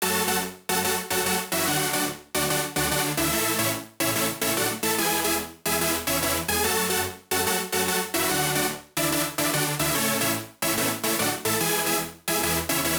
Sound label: Shuffle, 145BPM